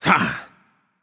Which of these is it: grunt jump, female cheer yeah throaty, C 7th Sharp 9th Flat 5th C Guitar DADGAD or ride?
grunt jump